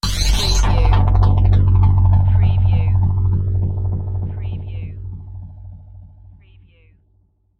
fluttering sci-fi revelation

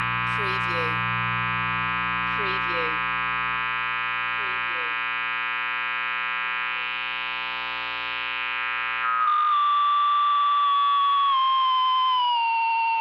Amp Noise Static Feedback

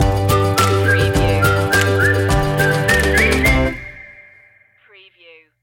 so happy stinger

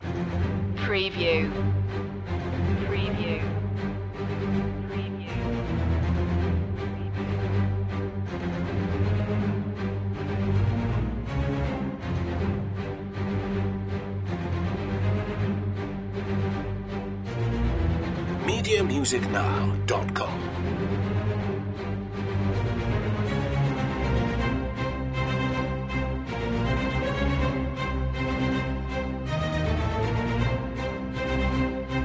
strings only

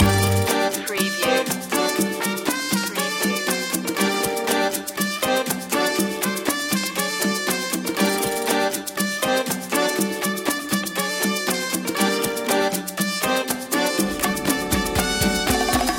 cuban heat

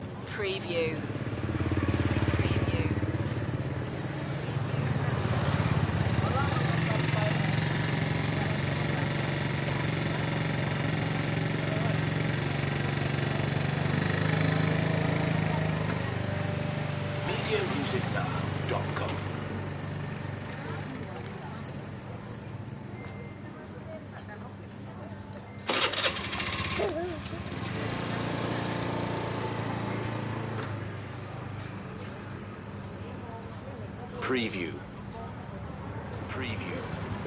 thai town street ambience loop

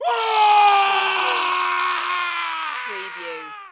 Scream Male

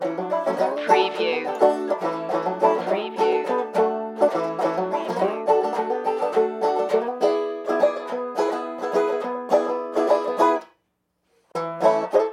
country boy breakfast loop

